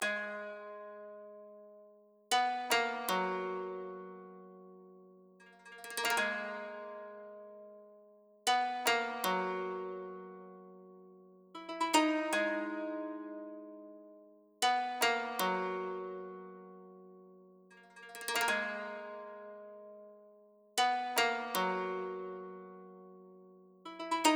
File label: 156 BPM G# Min Koto; Cymatics; Quest; Destiny Gold Expansion